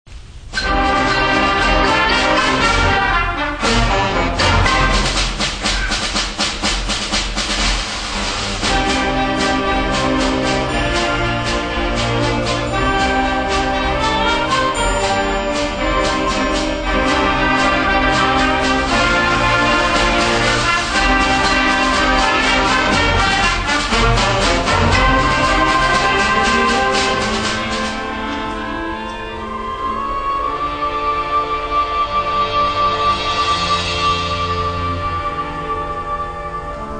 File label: Wind Ensemble; L.A. Valley College